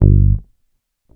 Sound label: Bass Short Low Dark G, Electric Bass One Shots; Slate Digital BASSHOLE Sample Pack